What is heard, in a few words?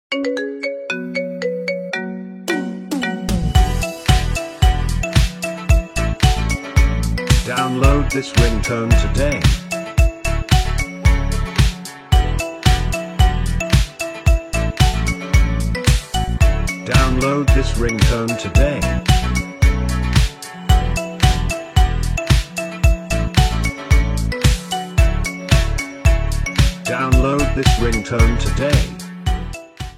Marimba Remix